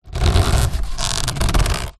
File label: transport car hand grasp steering wheel leather squeak